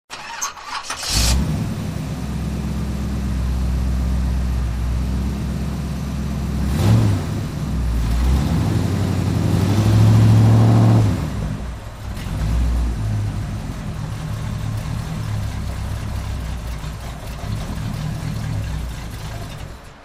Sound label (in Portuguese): som de carro